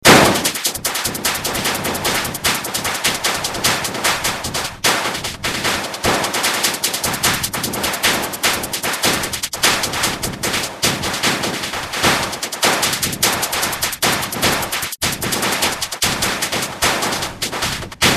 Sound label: fnoise excerpt